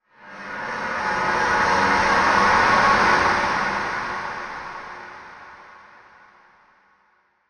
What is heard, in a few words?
scoopp